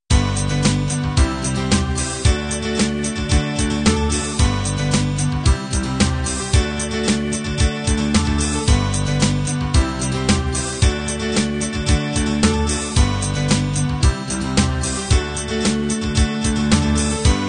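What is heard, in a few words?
pop 16 beat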